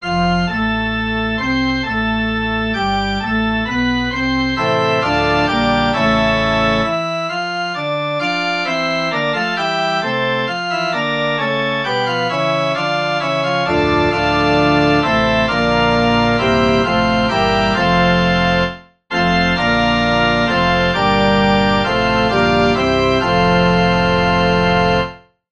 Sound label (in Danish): forspil; o herre krist dig til os